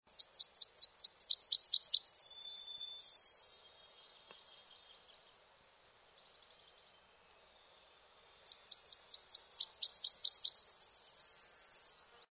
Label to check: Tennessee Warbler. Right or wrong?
Right